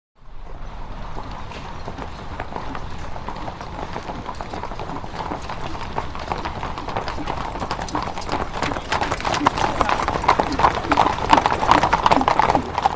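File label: Cavalry With Artillery Passes; Historical; Cavalry With Atrillery Passes; Hollywood Edge Historical Series; War Horses Armies & Weapons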